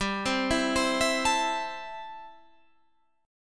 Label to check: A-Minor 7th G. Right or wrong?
Right